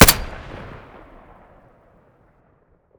muzzle glock17 stereo

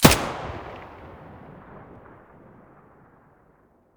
mp5 muzzle mono, weapons

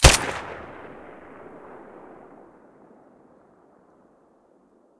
silencer glock17; weapons

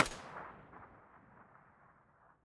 mp5 muzzle mono far, weapons

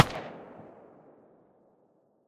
mdr silencer mono far, weapons

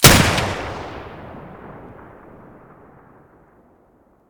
muzzle beneli mono, weapons; m1014

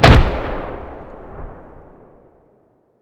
explosion mine far; STALKER; IWP

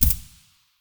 sig550 silencer far; weapons

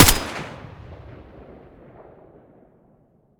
M4A1 muzzle mono

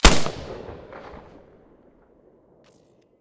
mosin silencer stereo, weapons

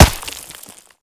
dirt, bullet collide; STALKER; IWP

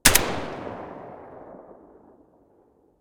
galil silencer mono

